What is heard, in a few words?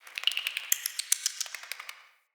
cave shrine critter